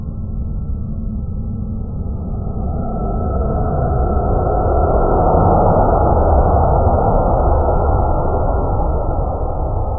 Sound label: atmospheric entry plasma